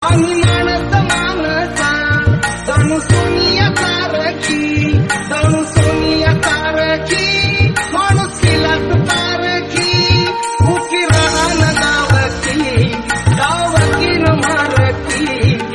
marathi

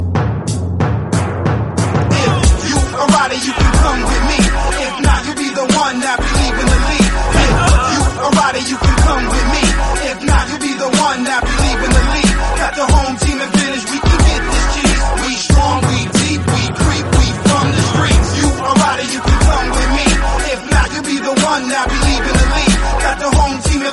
hip hop rap ringtone

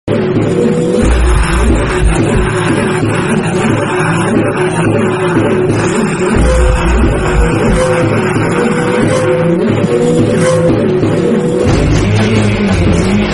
tamil bgm; pushpa

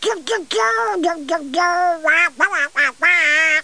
ducks

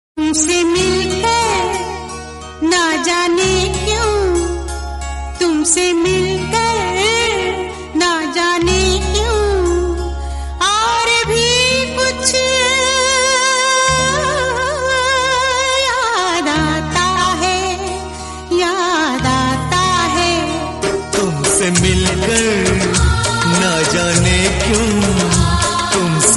hindi

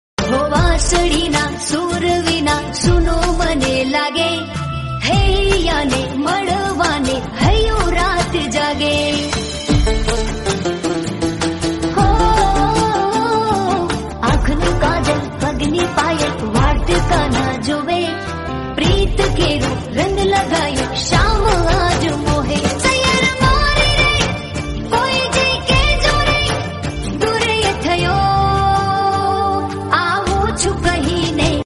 romantic gujrati

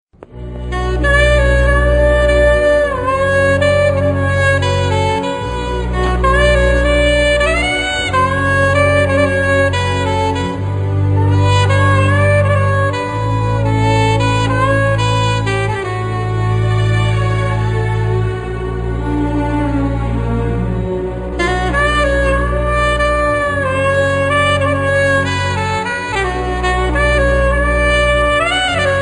instrument

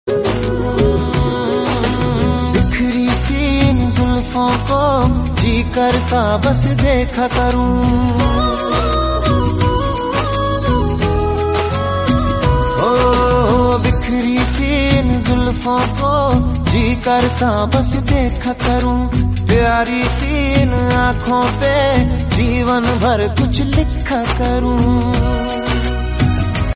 love romantic hindi

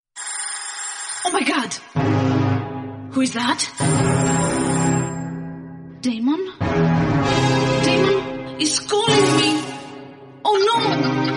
suspense; rayan

